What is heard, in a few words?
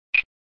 Sound SFX, flipper; sttng